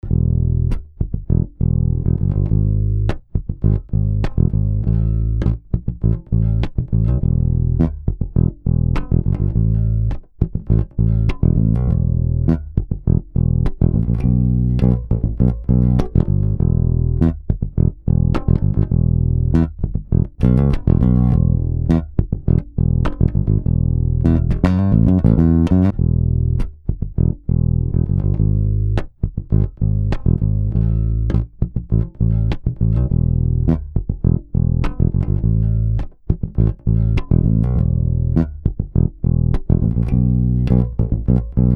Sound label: BASS